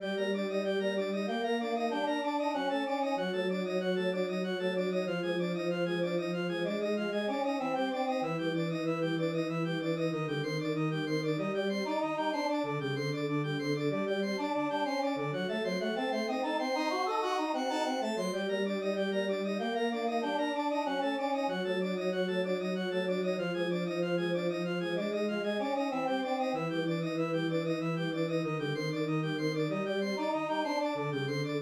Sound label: Roland SC-55; Zelda CGE: The Journey Within